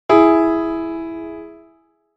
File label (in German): Intervalle simultan